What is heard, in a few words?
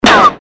good pain; CAC ASSETS